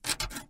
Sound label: move, crate